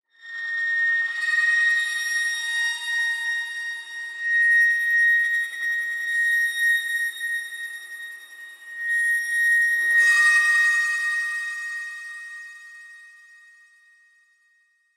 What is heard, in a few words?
METALLIC SCREE, Metallic Screech Scrape Delay